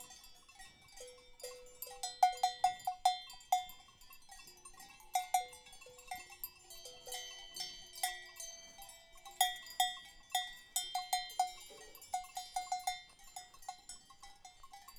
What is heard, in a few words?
bells